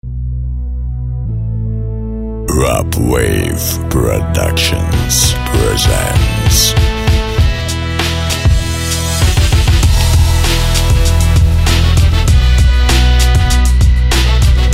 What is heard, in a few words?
Perky Beat